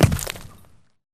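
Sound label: Bullet Light Ballistic Dirt impact; Titanfall 2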